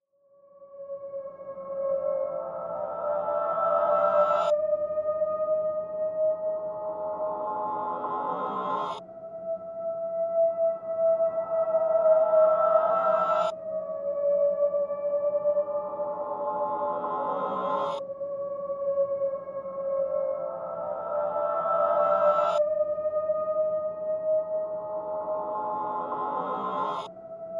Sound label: spooky; svencoop; htc